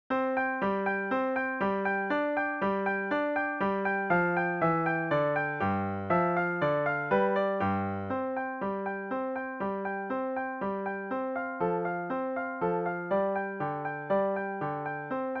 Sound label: acc jingle bells